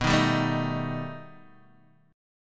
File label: B Augmented 7th B down Guitar Open D